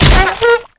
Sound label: rifle swing hit; survivor; clown